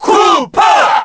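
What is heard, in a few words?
Cheer Korean, crowd; Bowser; SSBB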